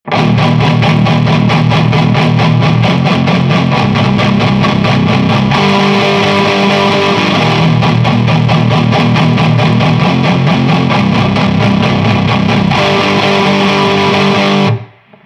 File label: palm muting